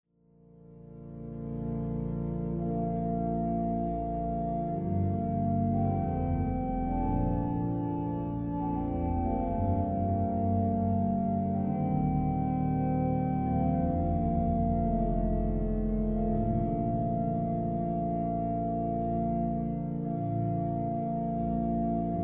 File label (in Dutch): Orgellied; Nader Tot U, O God